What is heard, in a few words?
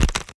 shrimp walk; customnpcs